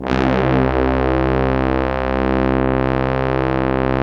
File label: BRASS, Brass Bones, Brs Layer